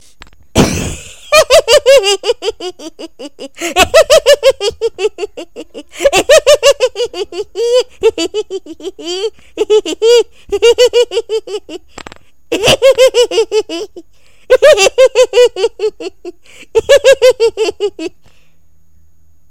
ki ki ki ki funny ringtone

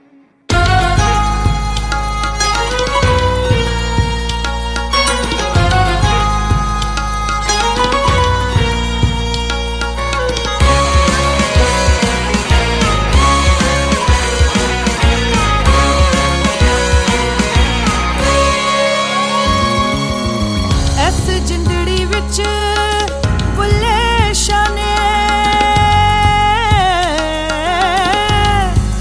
music ringtone bollywood ringtones

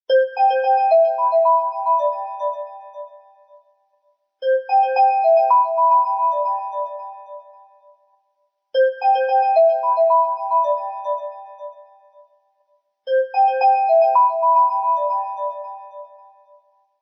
htc default ringtone

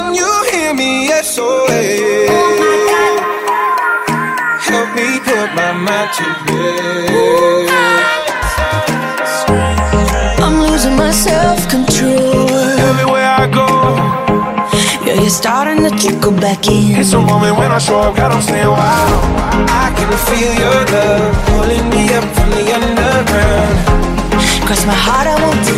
pop song ringtone; english ringtones

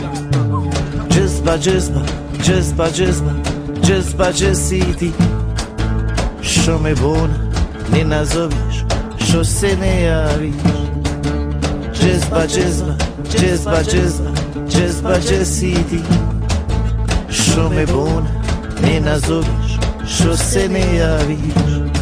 jazz bass ringtone

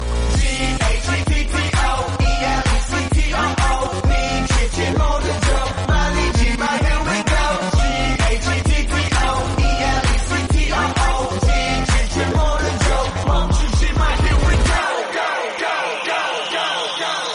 top trending background music tone ringtone; galaxy ringtones

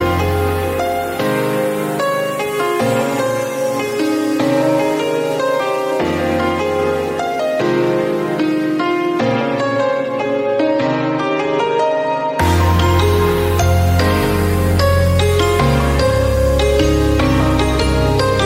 huawei p20 pro ringtone

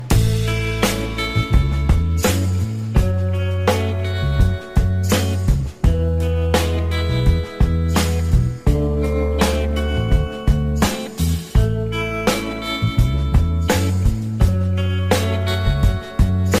flute music ringtone; bollywood

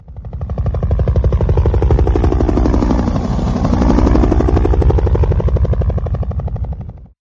helicopter 3d sms ringtone

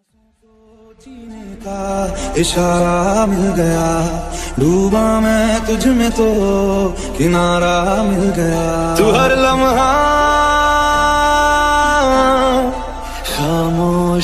love music bollywood ringtone

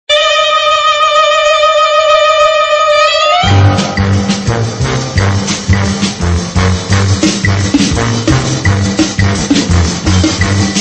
english movie theme ringtone

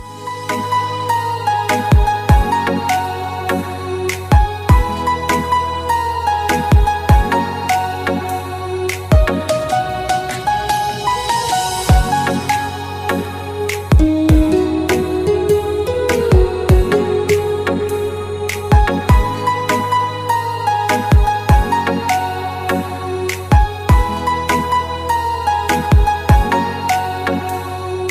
sweet music ringtone, bollywood ringtones

